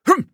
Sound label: Grunt Fast; Realm Royale; Assassin; Sly Scoundrel